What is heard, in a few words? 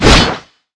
sword bombard slash